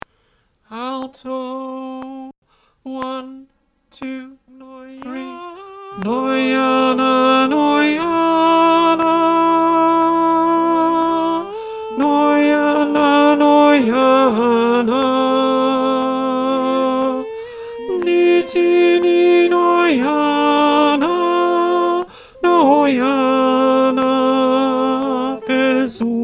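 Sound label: alto click track, choir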